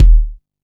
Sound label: Kick, Drum & Bass; AMG - Prototype Drum & Bass